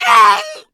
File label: death, voice; hunter